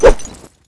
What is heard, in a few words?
cleaver swing, weapons